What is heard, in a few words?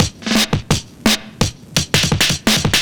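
Drum and Bass Beat 170, drumnbass 170bpm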